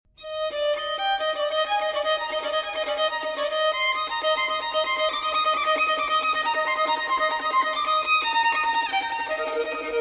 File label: Fantasia For Solo Violin in A Major, Allegro – Presto; No. 5; Telemann